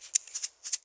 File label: MULTI EGGS; Best Service; World Colours